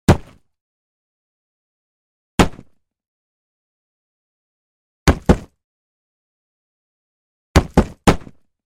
Board Hits; Hollywood Edge; Martial Arts and Human Impacts

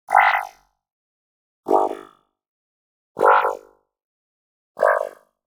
Digital Click Neutral

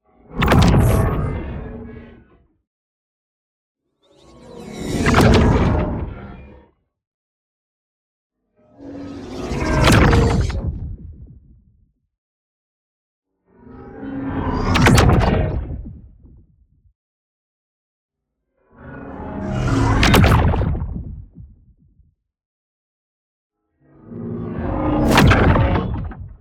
ABILITY DEACTIVATE Magnetic Force Drop; boom library; Matter

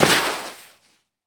Baseball Slide Dirt

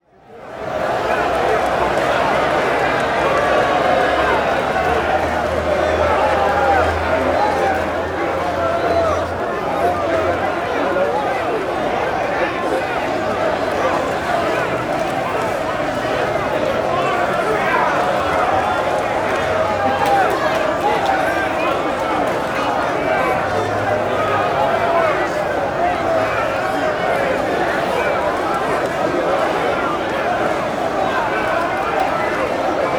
Crowd Outdoor Agitated Protest; Walla; Sound Ideas; Walla Series

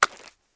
mud; firefight

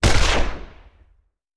Rifle; Sound Ideas; Guns

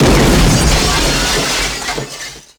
Explo Large Glass; Destruction

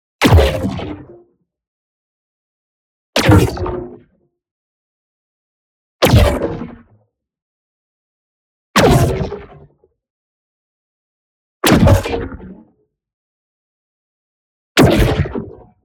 EXPLOSION SMALL Tonal Rebound; boom library; Matter

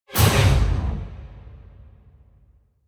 Symphonic Hit Single Sharp Chord; Hollywood Edge; sound designer's toolkit v2

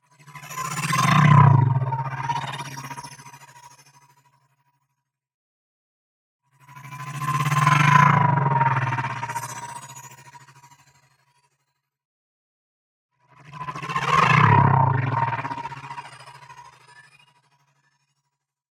NEON WHOOSH SMALL SLOW Granular Tremors; boom library

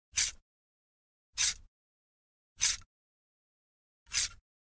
Generic Click Metal Small; boom library; The Interface

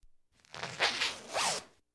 Household Phones

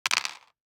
DICE on Cardboard, Throw and Roll, Mini, Six Dice; Samples; ArticulatedSounds; DICE Sfx Party (Extended)

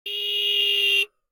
horn motorbike; Blind Drive

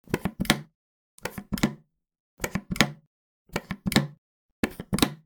pouring soap into hands; human; NS Studios - ultra sound collection

